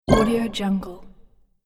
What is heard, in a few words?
spell impact